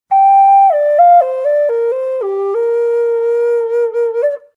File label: g minor concert, flutes; hi vol